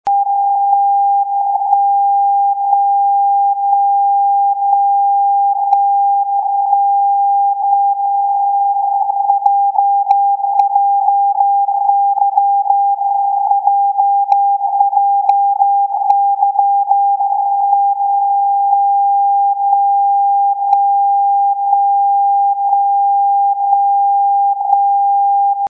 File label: JJY 40kHz